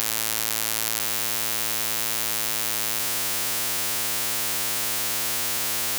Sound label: flattest waves full